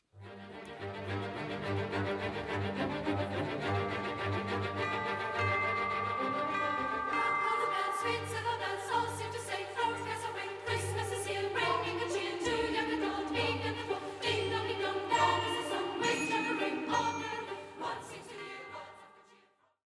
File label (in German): bell Ausschnitt